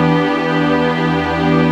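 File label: Pad E minor 7, Orchestral; Sonic Foundry (Sony Creative Software); Orchestral Series VOL-4 - Rock and Pop; Pads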